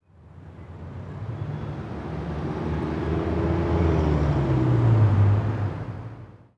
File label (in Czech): in autko; Mafie 1